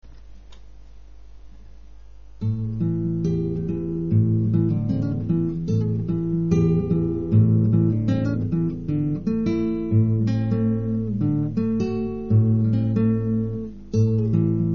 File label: FunnyBlues